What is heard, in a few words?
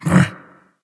confused; smite; voicepack